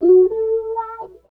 GTR; East West; Phat & Phunky

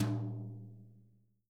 BRUSHES, Living Drums; Sampleheads; Peter Erskine Living Drums

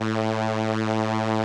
SOLINA, String Machines

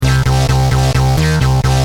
Driven, Bass; Industrial

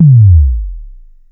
TOM KIT F#3, TOM SYNTH; AKAI; USB Soundscan vol.20 - Fresh Disco House I

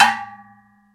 BATH

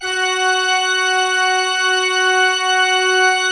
F4, Cathedral Organ; Propeller Island

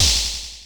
PTX PER; Crypton - DrumBase5000

